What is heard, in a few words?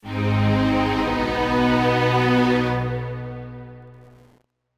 Reward Court